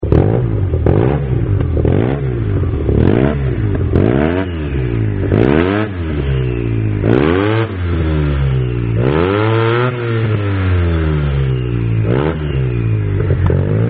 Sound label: akrapovic exhaust on yamaha tmax